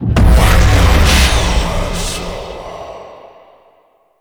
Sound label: Unholy Punch Cast; Roathe; Warframe; Tau; Abilities